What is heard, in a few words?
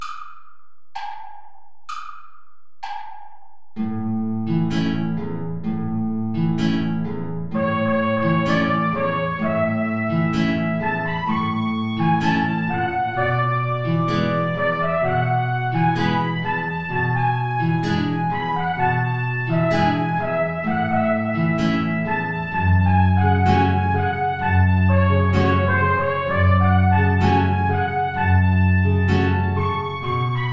tango play along without trumpet; albeniz isaac